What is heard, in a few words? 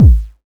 909 BD Tech; Goldbaby Tape808 & Tape909; The Tape 909